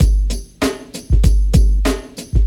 129 bpm 1990s breakbeat g key, Free breakbeat - kick tuned to the G note. Loudest frequency: 551Hz